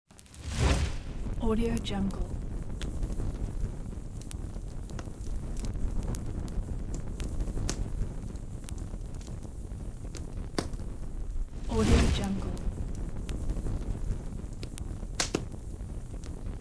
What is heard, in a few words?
fire torch